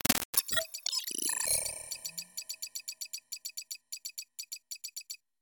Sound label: glitch sequence